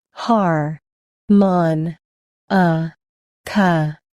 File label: harmonica